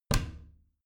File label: bathroom cabinet warped close regular; objekte; tueren